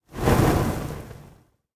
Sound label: lobby victory burn; smite; god daji skin15